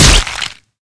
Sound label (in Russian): bistrij rezkij zvuk perezaryadki